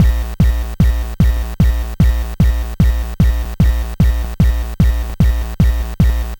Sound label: NES Bass Drum Loop; Nintendo Entertainment System; Drums; Chiptune; Sonic Foundry (Sony Creative Software)